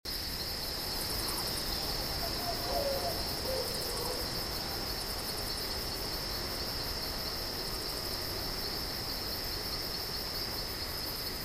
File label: Forest Night; Temple Run: Brave